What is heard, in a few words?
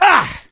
melee swing, gambler, survivor voice